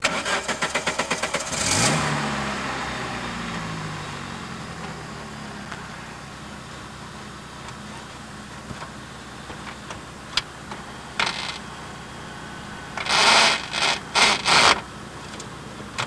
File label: start, cars; transpo